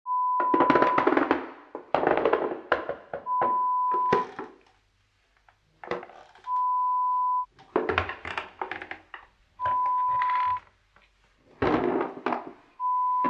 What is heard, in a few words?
Creaky Floor Horror Sound Effect